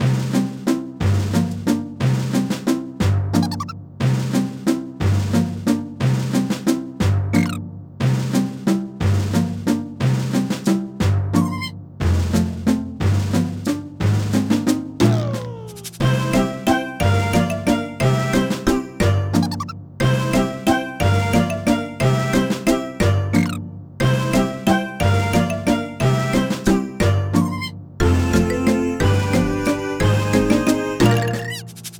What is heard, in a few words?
Lobby; Cookie Run: OvenBreak